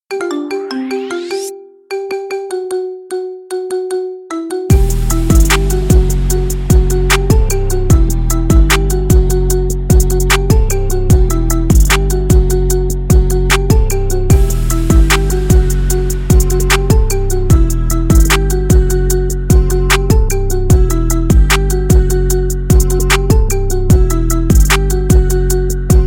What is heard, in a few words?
marimba remix